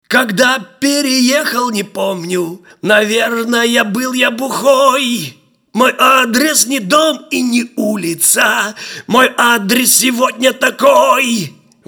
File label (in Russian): vokal